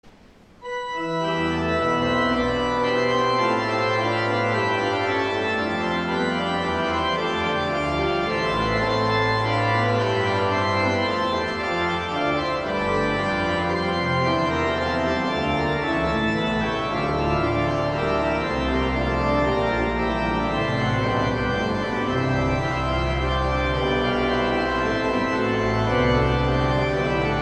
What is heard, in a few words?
Organ, Chorale Prelude; Johann Sebastian Bach; 'As Jesus Stood Beside The Cross', BWV 621; Ash Wednesday; St. John's Cathedral, Jacksonville Florida